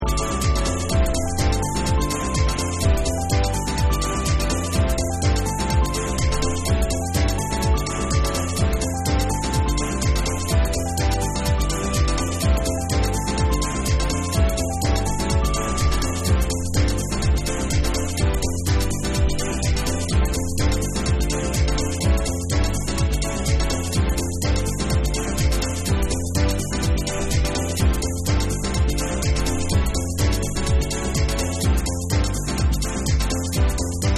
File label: LATIN JAZZ